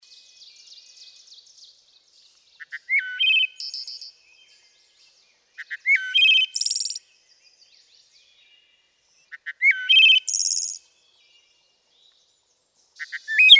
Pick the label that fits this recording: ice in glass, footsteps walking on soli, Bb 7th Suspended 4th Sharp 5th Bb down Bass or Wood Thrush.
Wood Thrush